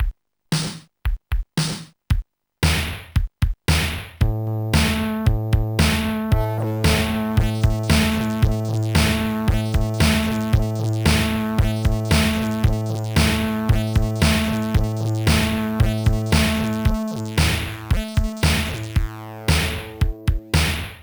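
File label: rhythm; Sonix Music Driver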